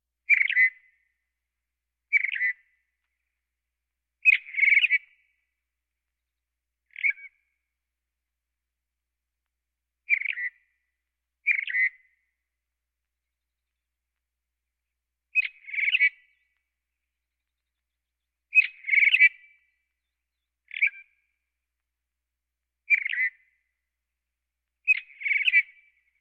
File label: Birds; Valentino Production Sound Effects Library CD50